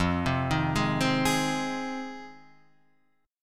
Ab Major, F, guitar chord; arpeggiated; Ab/F; JGuitar

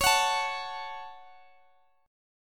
Db Suspended 4th Sharp 5th, Guitar, Open D

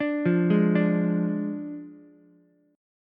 D Diminished D, Ukulele; arpeggiated

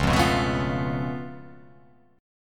C sharp Augmented 7th, C sharp, down

